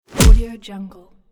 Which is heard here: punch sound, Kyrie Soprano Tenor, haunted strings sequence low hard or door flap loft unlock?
punch sound